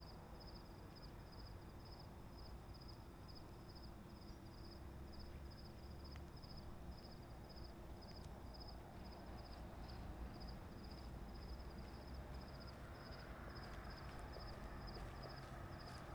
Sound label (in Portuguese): Caminhonete passando no noroeste a noite com grilos; maquinas